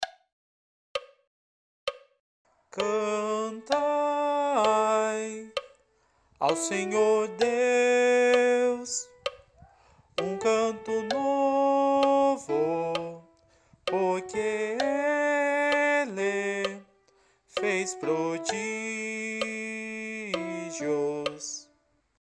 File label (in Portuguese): coro imaculada